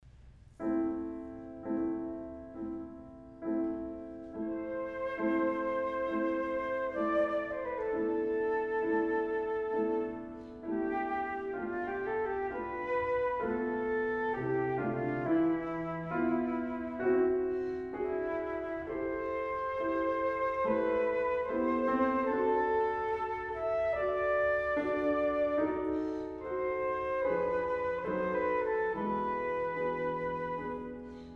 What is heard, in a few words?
Sonata for Flute and Piano, Aria